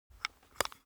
object makeup box close

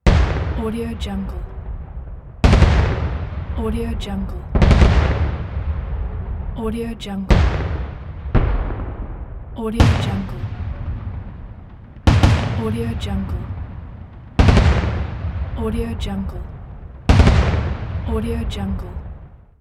fireworks

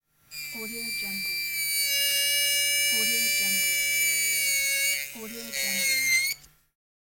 angle grinder

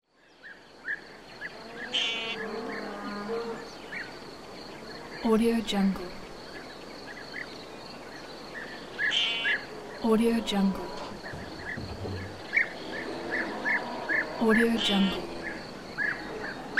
farm

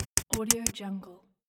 tiptoe footsteps

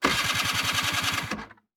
scooter false start; soundbits-soundfx

